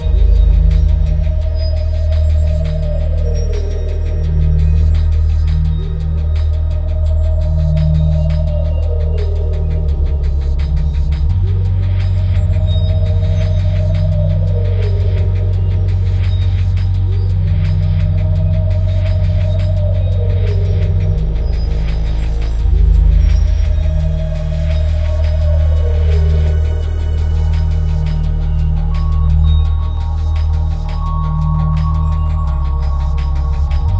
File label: ambience; Mirror's Edge (2008)